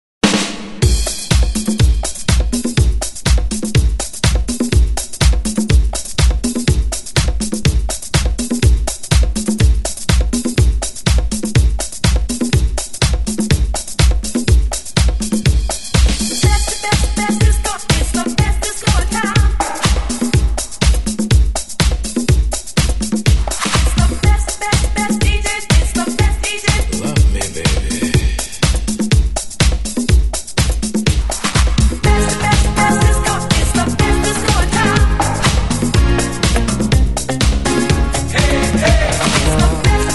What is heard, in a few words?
70s Re Drum; DJ Mhark